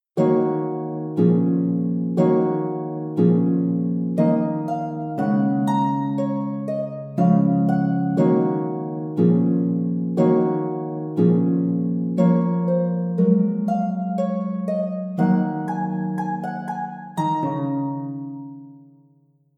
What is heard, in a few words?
Open World Harp